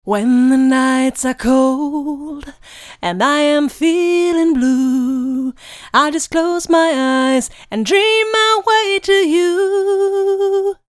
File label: Vocal